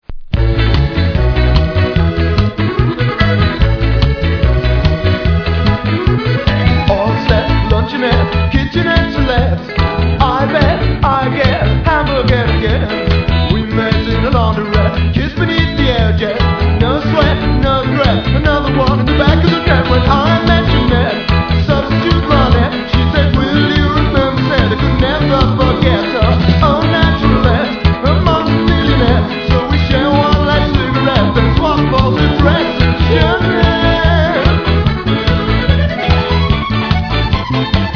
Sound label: beat